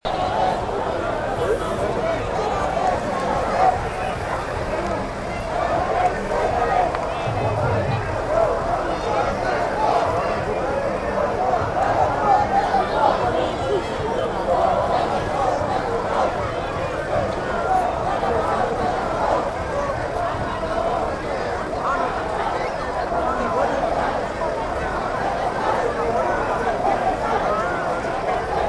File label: Tamil protests